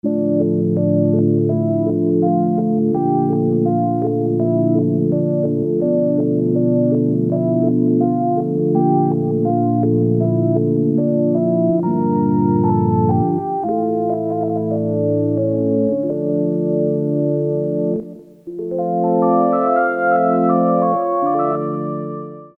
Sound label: ORGAN, Korg DSS-1; Multiple Inst